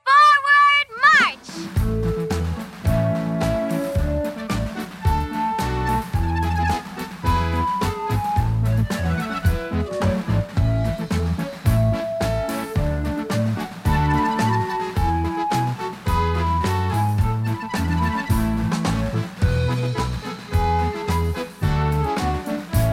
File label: birthday march